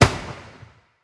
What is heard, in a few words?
Fireworks Small Explode; angry birds rio